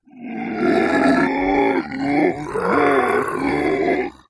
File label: zombie death; svencoop